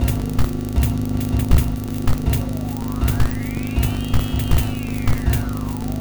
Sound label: Glitched, Processed Percussion